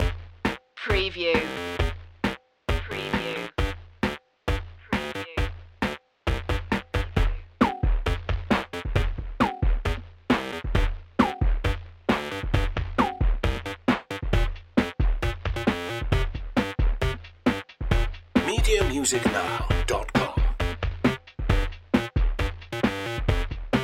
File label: bass drums